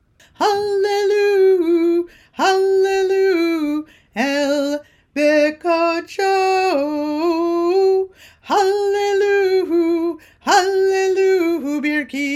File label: Psalm 150 Verse 2; Kulanu Joint Saturday Morning Service; Shabbat